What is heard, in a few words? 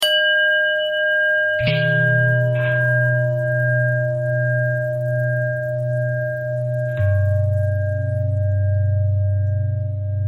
long bell bell threads, bells